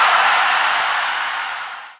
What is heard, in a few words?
cheer down; kingpin